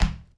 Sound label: Char Fs Sprint Wood; We Happy Few